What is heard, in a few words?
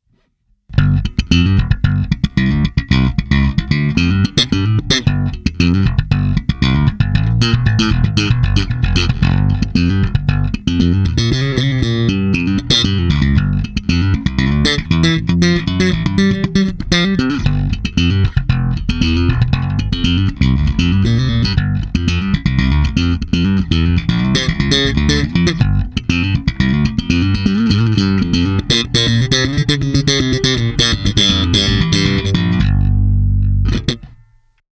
Pf-800 Slap